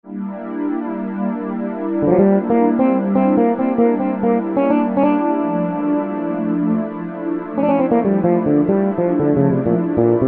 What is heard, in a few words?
Fretles Pad, Yamaha EX5R; Factory presets